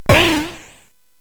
SFX; Narco Police; hvsc

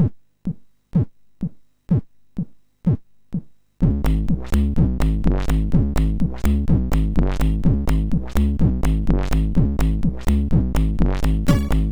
sid MOS6581R4

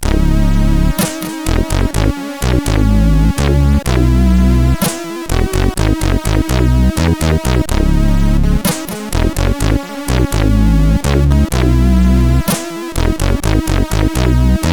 Ingame Jingle, CSG8580R5

